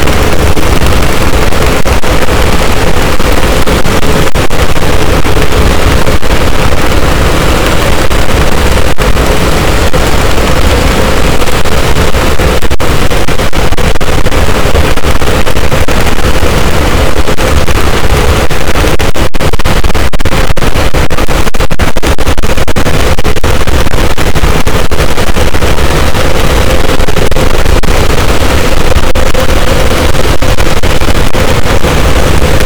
cachalot, boat; Physeter Macrocephalus

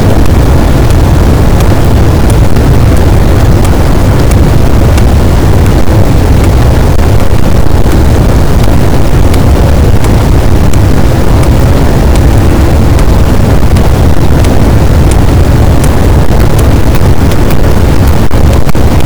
cachalot positif, boat, Physeter Macrocephalus

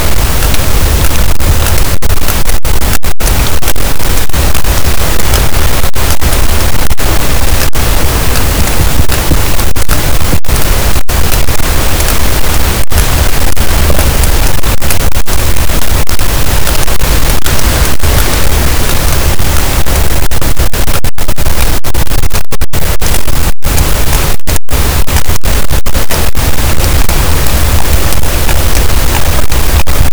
cachalot, Physeter Macrocephalus, gaussian noise

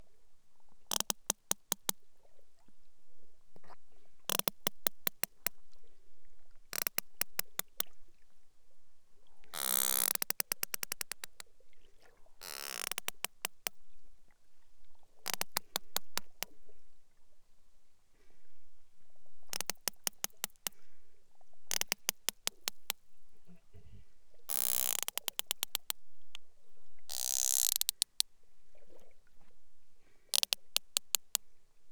cacha

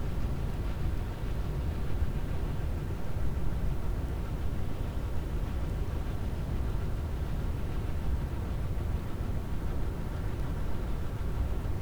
cachalot negatif, Physeter Macrocephalus

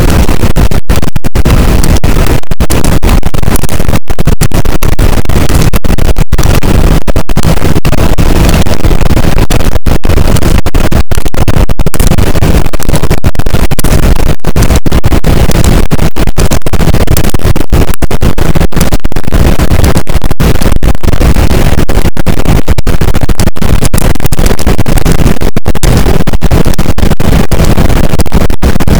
cachalot positif, boat; Physeter Macrocephalus

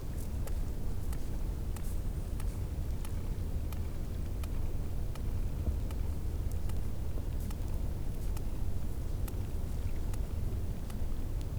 cachalot positif, Physeter Macrocephalus

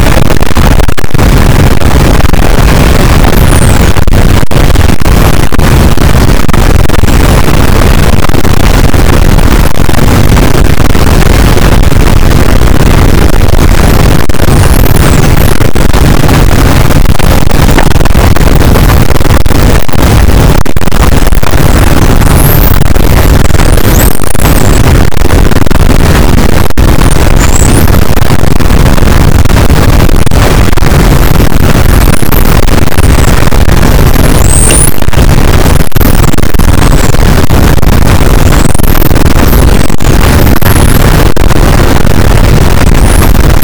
cachalot negatif, boat, Physeter Macrocephalus

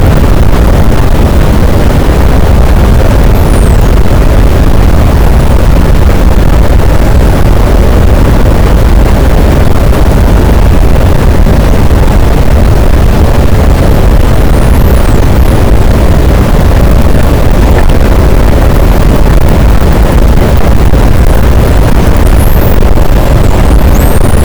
cachalot positif, boat, Physeter Macrocephalus

